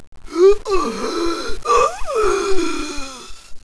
death

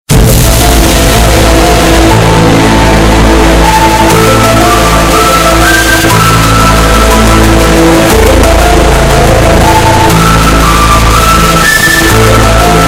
ufo

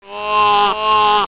grunt die; hgrunt2

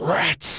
death; yamcha

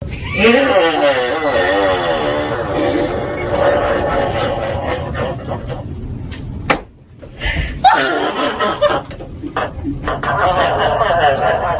lmao

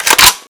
m16 bolt pull

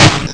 gun, weapons

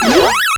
long jump; pacman sfxt clasico

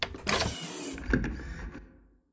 disk insert

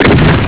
barrel, shotgun; Doom SNES

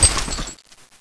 draw, weapons; CSO

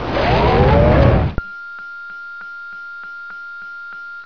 sonic weapon

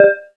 error; CKF III

